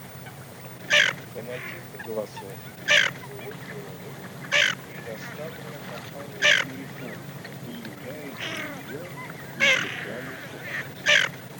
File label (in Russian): Хохлатая чернеть Moretta; plurifon